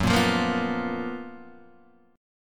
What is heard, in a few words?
F sharp Major 11th; F#M11 Chord {2 1 3 3 0 1} strummed